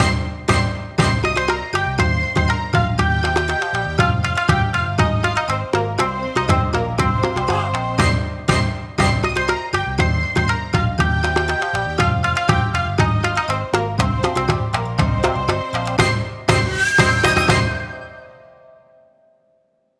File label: Demo Jingle; KG Oracle